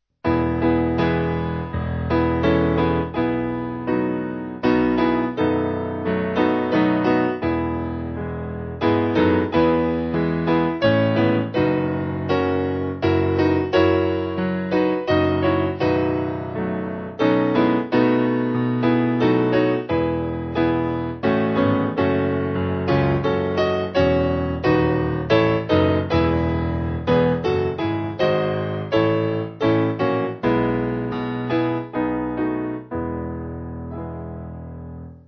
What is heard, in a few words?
piano